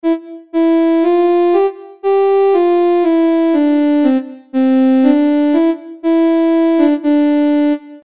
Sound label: harsh flute ode to joy